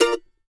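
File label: mandolin A# major, Country; Sampleheads; Whole Lotta Country 1 & 2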